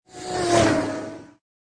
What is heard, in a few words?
car zooms past, cartoon; Cartoon FX